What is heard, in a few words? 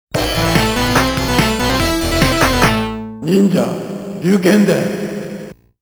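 Round Clear (arcade)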